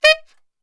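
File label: D# saxophone shot, Brass Super Section; Best Service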